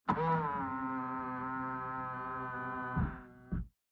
Car Interior Folding Wing Mirrors; noise creations

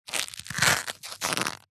leather wallet bend stress creak

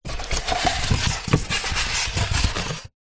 polystyrene blocks inside cardboard box movement; zapsplat; foley

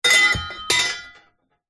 impacts metal hollow pieces drop

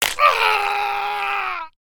horror bone arm leg break male scream; sound effects one

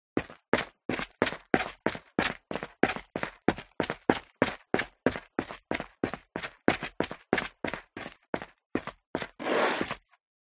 FOLEY STEP Dress Boots Concrete Grit Run Sequence; smartsound

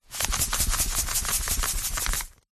food sugar paper bag shake; zapsplat

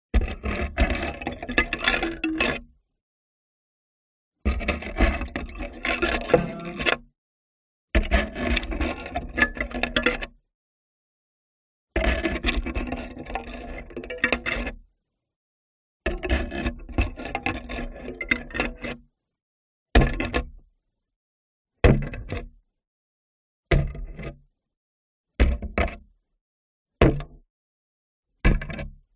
contact mic brush poke wood plank with wire brush

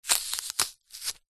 food apple knife twist crush split

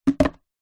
camera dslr set down on table; technology; zapsplat

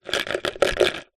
office pencils in plastic large pot movement; zapsplat

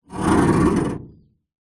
foley metal grind scrape on metal hollow large

